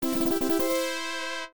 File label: Battle Win; madou monogatari 1-2-3; pc-9801